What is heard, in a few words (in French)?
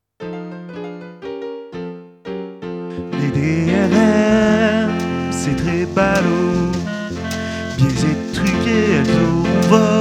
Comptine